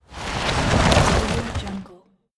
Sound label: car pass by on paved street